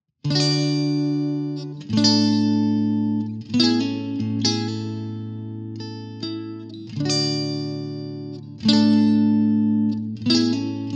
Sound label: clean; jensentone